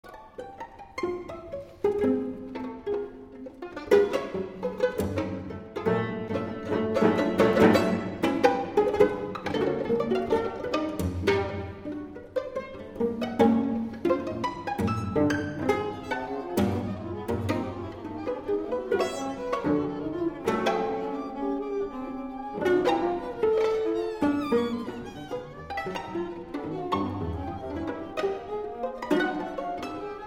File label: string quartet